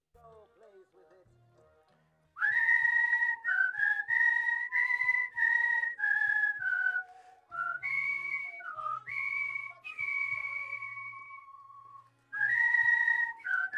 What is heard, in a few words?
Whistling Bridge